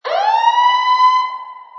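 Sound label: bird chirping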